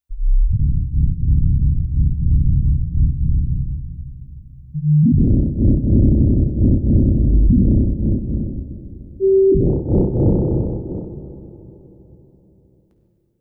subterranian sonar

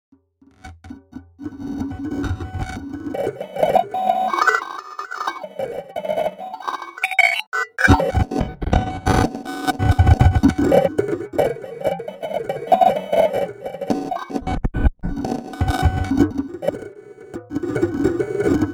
super fast notes

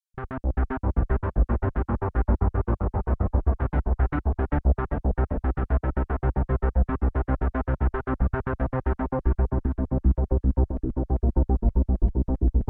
Juno-106 Arps

